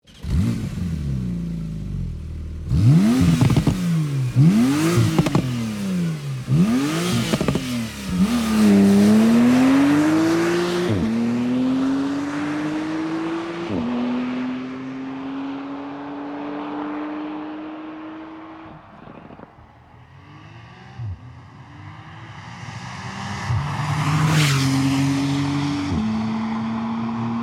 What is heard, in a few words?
Porsche 911 Carrera T 991 MK2 Standard Exhaust